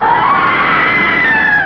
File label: Death screams; IQ2 Demo